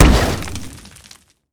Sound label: armordillo powerball oh; rammus